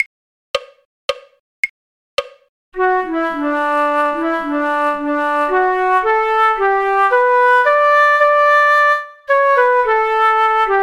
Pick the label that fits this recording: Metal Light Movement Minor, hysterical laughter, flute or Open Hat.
flute